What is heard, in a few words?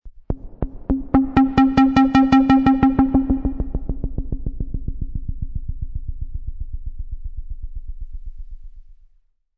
Low stuttering beep sound.; Strange Tones; Sound Design